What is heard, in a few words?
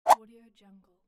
screen change